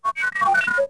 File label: Beeps, droids robots